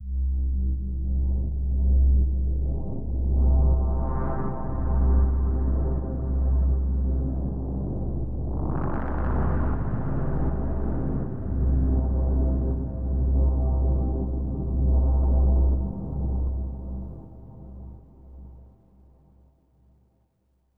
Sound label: Overlay Drone